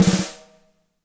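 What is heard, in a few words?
DRY snare, NATURAL KIT; Spectrasonics; Burning Grooves CD2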